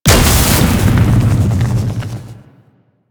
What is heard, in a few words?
Plunge Ability Angry Explosion; Enemies; Abilities; Warframe; Duviri; Duviri Dax; Dax Knight